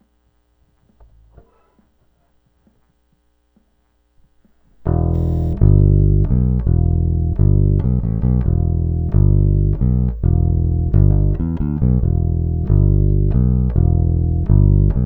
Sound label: bass